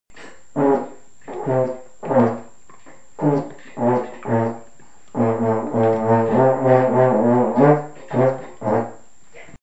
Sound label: hot cross buns